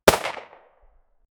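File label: anechoic ar 15 single gunshot, firearm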